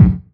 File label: 909 BD amp; Goldbaby Tape808 & Tape909; The Tape 909